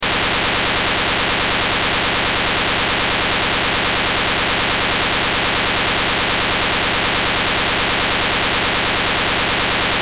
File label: Moto P25 Test